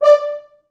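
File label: 4 French Horns, Horns; D4; East West; Quantum Leap Horns Sax; 4 FrHorns MOD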